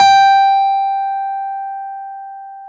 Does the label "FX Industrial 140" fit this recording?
No